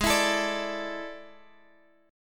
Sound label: A Major 7th Suspended 4th G sharp